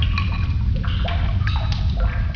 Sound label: cave drip